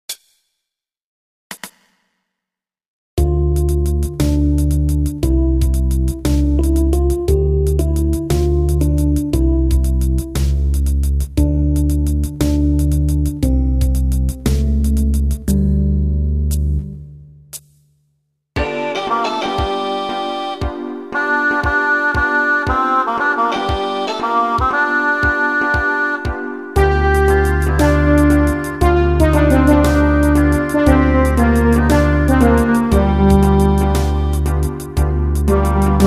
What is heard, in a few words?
jamaican